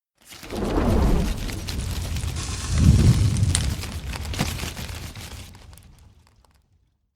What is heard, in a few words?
phoenix fire only; Nature; DailySounds